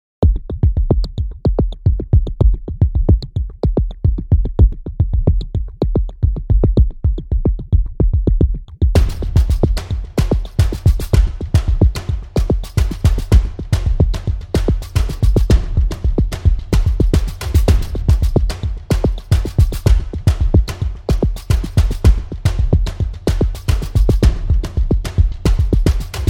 black trees drums

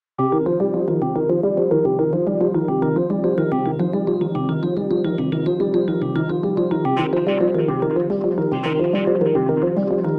keyboards

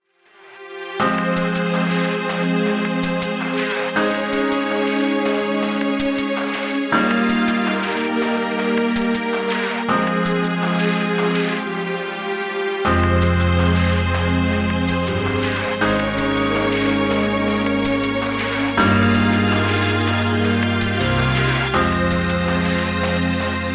strings

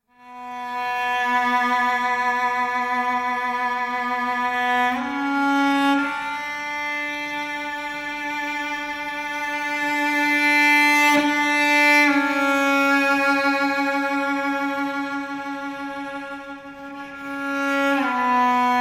strings

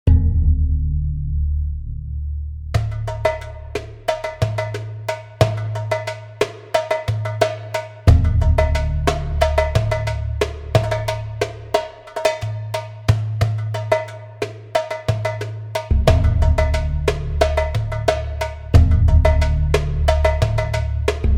desert sand drums

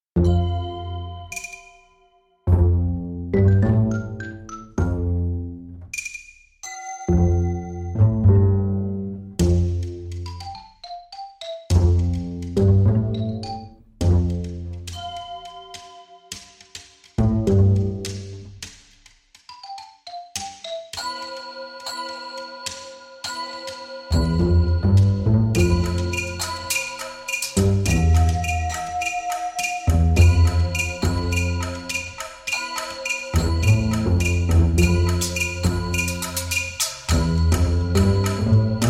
bass drums

